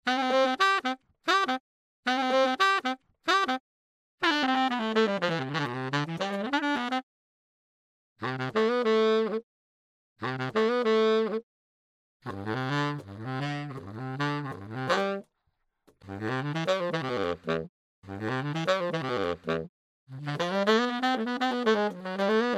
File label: bariton